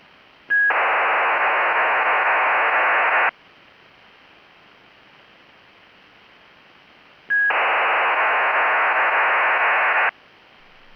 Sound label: 2400 Bd TADIL; CHN